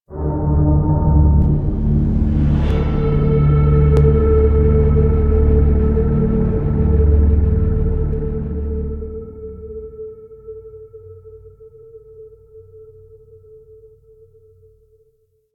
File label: Arena Reveal Stinger; Octopede; Warframe; Man In The Wall